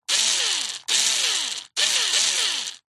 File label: industrial power tool electric screw driver bursts; zapsplat